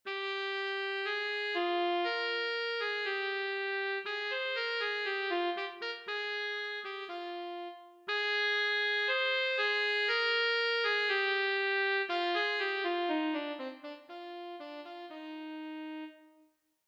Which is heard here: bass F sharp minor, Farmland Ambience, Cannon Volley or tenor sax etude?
tenor sax etude